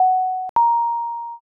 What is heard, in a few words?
elevator